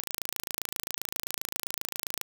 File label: 27.5625Hz Buzz